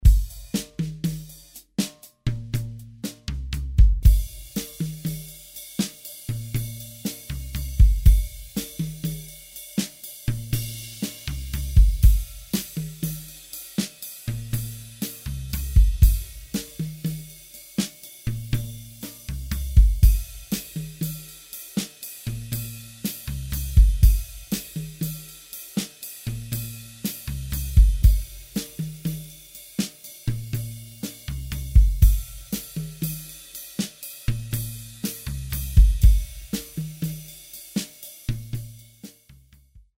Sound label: blue drums, drum system; ezq drum system